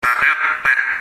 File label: duck call, whistle